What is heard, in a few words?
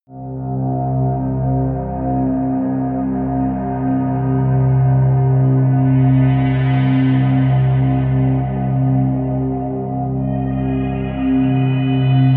drone uncertainty